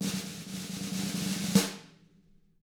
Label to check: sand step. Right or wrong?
Wrong